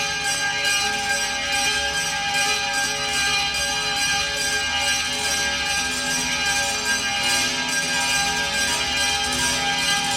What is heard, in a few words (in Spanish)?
Guitarras